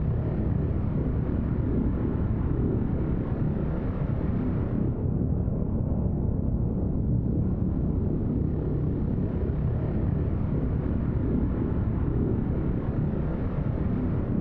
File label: ship colossus idle; Stellaris; machineage